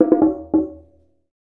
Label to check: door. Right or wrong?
Wrong